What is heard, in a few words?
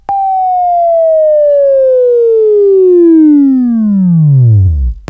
Buzz; Labview-Program; Sweeping Training program